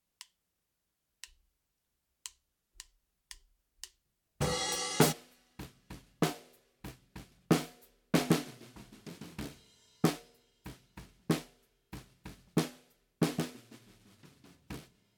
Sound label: No Effect Snare Bottom; Drum Recording Setup